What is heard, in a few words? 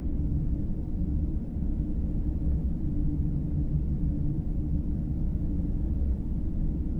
living quarters